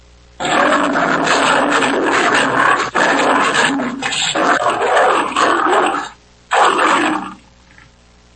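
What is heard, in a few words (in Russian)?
лев набросился на жертву и растерзал ее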